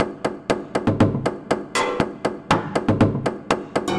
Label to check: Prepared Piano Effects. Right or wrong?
Right